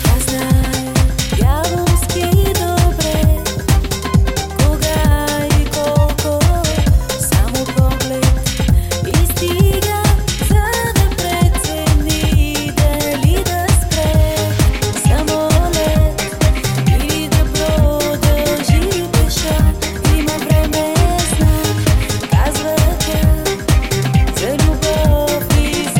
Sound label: Remix